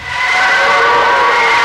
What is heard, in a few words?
vox Sonic Growl